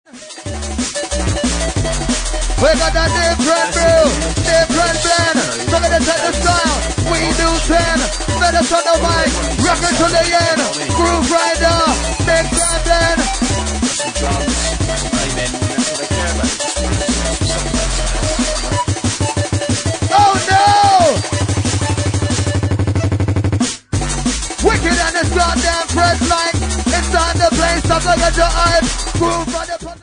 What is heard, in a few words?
DNB